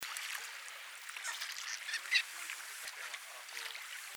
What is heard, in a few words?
Fairy prion